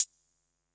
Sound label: rich top end pedal hi hat single hit g sharp key, Royality free closed high hat sound